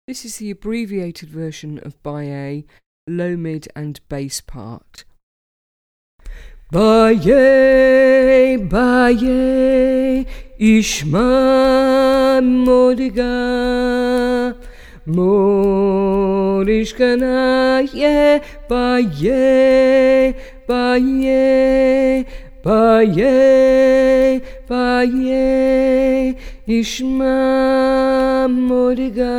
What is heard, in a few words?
LM BASS; BYE AYE